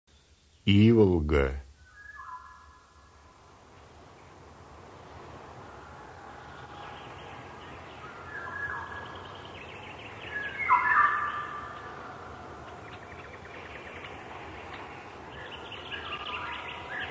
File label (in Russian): ivolga obyknovennaya golos